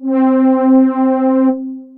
Swell Brass; Instruments; Ableton; Factory Packs; Synth Essentials; Operator

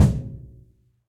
Kick Felt Club Sizzle; Drum Hits; Ableton; Factory Packs; Session Drums Club; Standard Hits

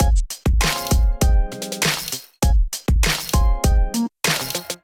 Shady Kit Groove 99 bpm; MIDI Clips; Ableton; Factory Packs; Beat Tools

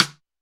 rim; Ableton Foundation FreePacks

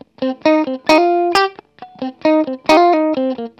133 Pop Guitar S-Note F; Pop Rock; Electric

